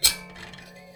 Finger Hit, Prepared Kit; Battery 3 Sample Kits

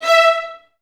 E4, Advanced Orchestra; Best Service

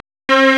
STRNG; CMP - Analogon

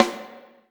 SN rm, EMU Orbit-3; 300 Drum Machines

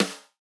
Snare, 120bpm; Big Fish Audio; Old Skool House; Construction Kits; House of Amnesia

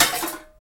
PRC INST, Trash Tuned; Roland - Rhythm Section; Trash+Kitch

